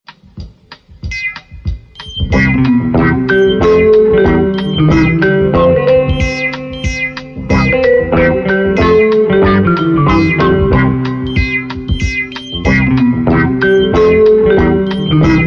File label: Hoppity Hip Twang